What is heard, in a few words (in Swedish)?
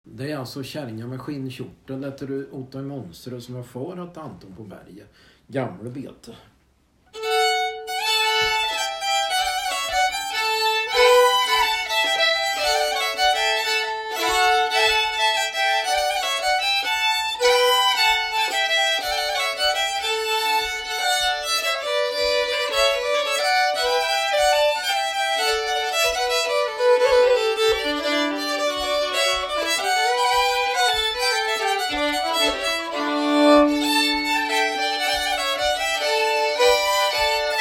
Polska, bagpipe fiddler; efter Otto i Monserud far till Anton på Berget